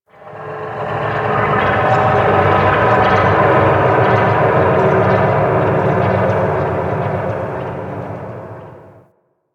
dark telemetry; Warframe; Corpus Railjack; Ambience

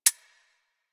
Tommy Mech Clip A, Weapons; Warframe; Tenno; Tommy Gun

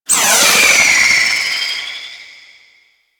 Grenade Throw; Warframe; Grineer Bombard Manic

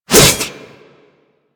Light Glaive Whoosh B; Warframe

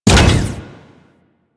Moa Leap Land Sweetener; Warframe; Venus; Venus Terra Moa; Abilities

